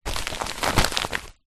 parcel large padded envelope movement; foley

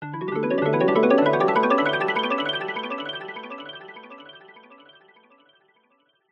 sound design magical rising twinkling nostalgic

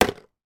food stock cube put back in box; oxo

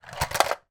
toy truck car crash flip; sound effects one

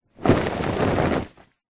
foley clothing shirt shake

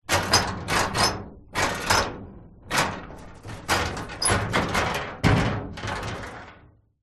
office filing cupboard metal old handle turn; zapsplat

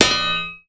impact metal heavy thump clunk

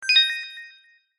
game sound soft warm digital tone positive select

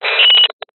science fiction radio signal interference burst

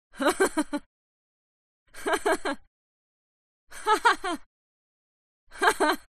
HUMAN VOCAL Female Laugh Haha Short; smartsound

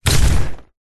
impact body slam hit against metal surface hard

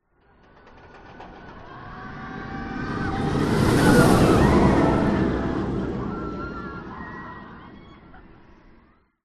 leisure theme park roller coaster pass by dip

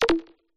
os battery low; omar alvarado

